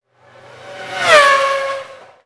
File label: Car Passby Pitch Up